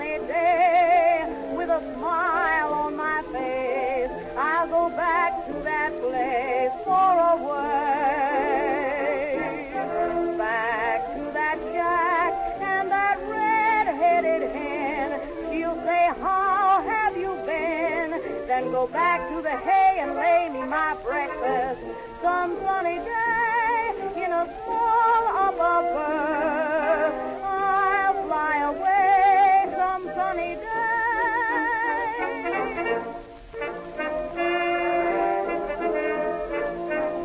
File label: Radio; lethal company